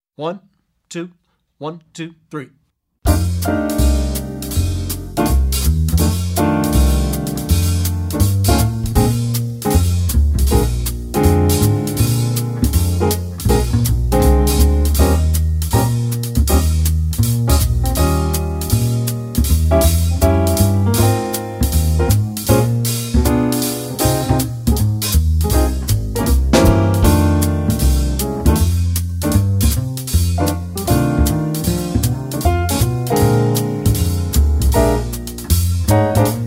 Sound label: Without Soloist